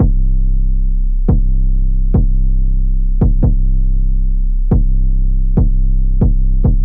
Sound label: Tempest 808 Bass C 70 bpm, Trap Drums; Trap Drums by Sound Oracle; Ableton; Factory Packs